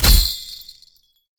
shake bell

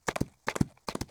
sheep run

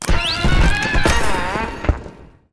carnival float begin

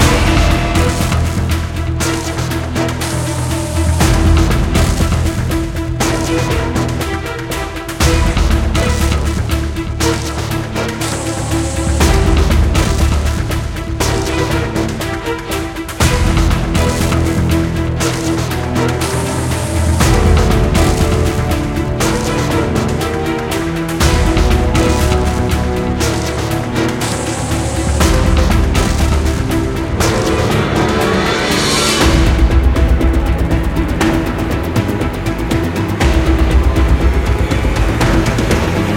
avg tense loop, music

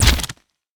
book

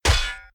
axe hit scrape

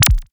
funnel end

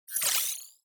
atk electric stick

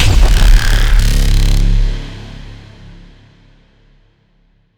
skill snake finish

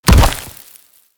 prick

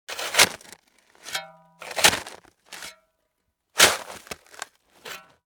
shovel

